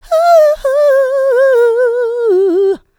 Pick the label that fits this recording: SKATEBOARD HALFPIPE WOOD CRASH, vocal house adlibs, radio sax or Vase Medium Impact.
vocal house adlibs